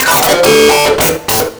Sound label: dist loop